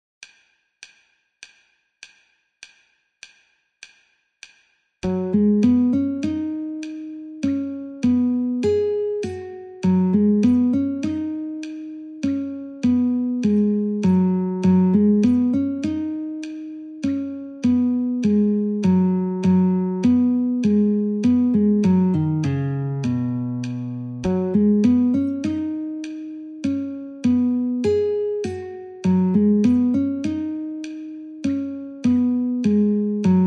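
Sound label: B Guitar metronome 50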